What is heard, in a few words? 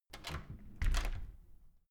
close soft handle, tueren; objekte